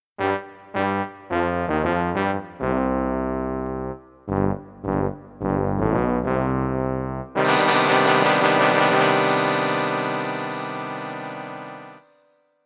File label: Theme backing